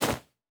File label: Cloth Hit Movement; CombatSFX